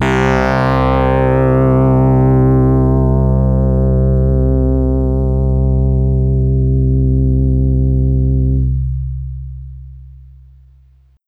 BASS Big evil FM bass

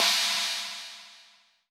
China, crash

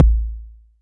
BD; TRX

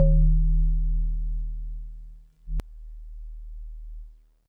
GVibes DX100 Ds1; good vibes

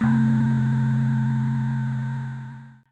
Synth, Gasious Giantz Note

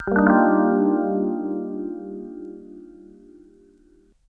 DX200 E Piano Trill Vinyl Synths Cmin11 C2; vinyl chords

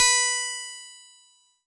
Harpsichord DX100 B4

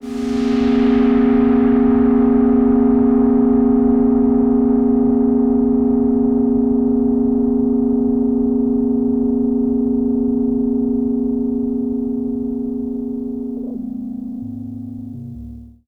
CHORD PAD With Thunder; Dune Valley

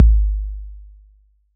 BD 808 Decay

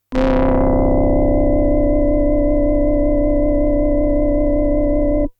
Synth, Flute Saw Raw Low

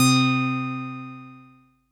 SYNTH Mini Note

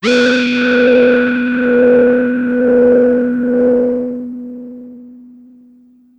Synth, Foggy Horn 90s

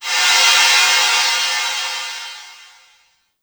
Crash Cymbal

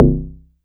FM Perc C2

